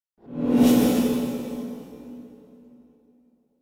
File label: Metallic Reverse Hit Impact - Metal; Show up; Hollywood Edge; sound designer's toolkit v2